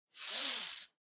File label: Stopwatch Digital Strap Shorten